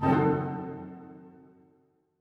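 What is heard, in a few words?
orchestra; Masterbits - Switch FX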